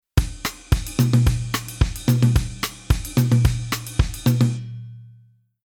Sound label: Rhythm Latin